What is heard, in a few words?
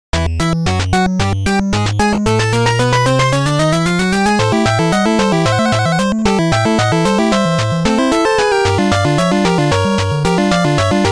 Stage Music; Armadillo; NES